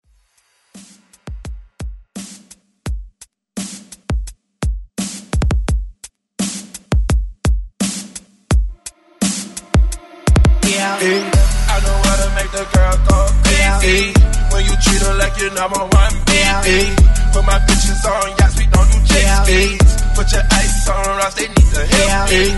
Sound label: ReDrum 85 BPM